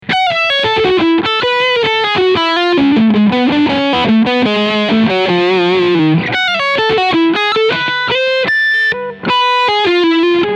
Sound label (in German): Leadsound Steg Humbucker; Ibanez AZ2204